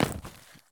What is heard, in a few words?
landing asphalt; Escape from Tarkov